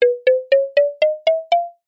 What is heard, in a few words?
cartoon ascend mallets low to high slow